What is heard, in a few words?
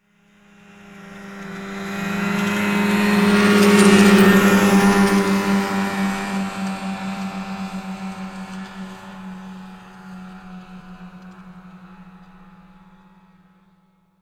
snowmobile departing